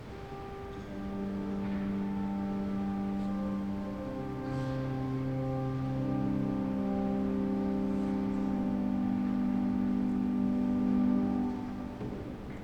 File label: Organ interlude; Colloquium; Vespers